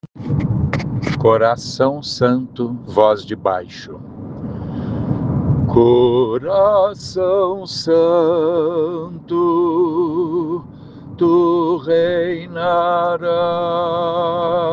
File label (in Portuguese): coracao santo baixo; coral sao francisco; missa comemoracao sagrado coracao de jesus